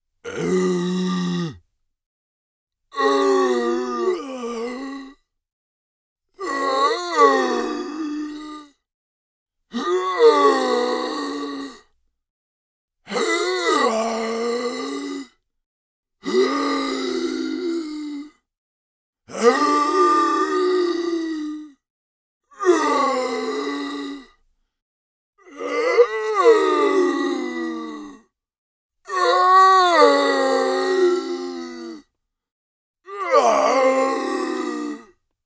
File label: curious zombies moan medium